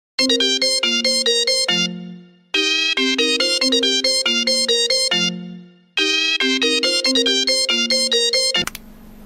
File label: Pop Cat; Samsung